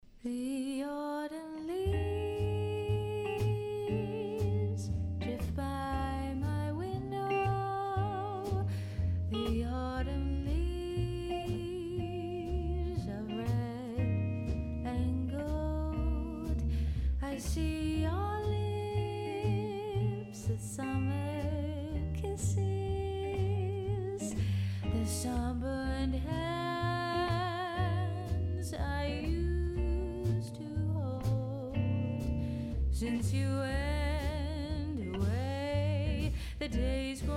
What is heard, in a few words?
Jazz Trio, Female Fronted Live Jazz Band